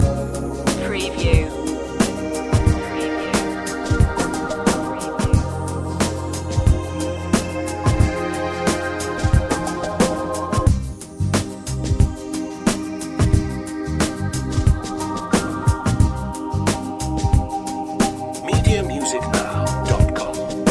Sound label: smooth waves loop